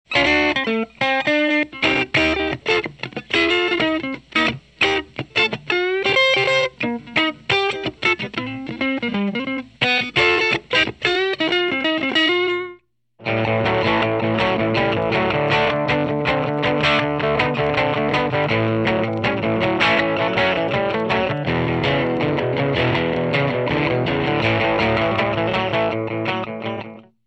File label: dirty AC-30